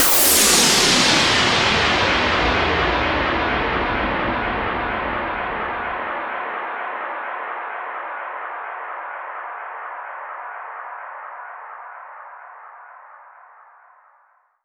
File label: FX Downlifter